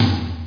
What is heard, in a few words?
A#-1 Power Tom Mid; SoundMusicSys; Simple MIDI Player